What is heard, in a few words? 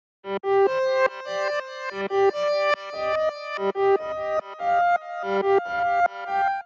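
Bouncy (C minor) 144 bpm Pad Verse; Ableton; Factory Packs; Samplification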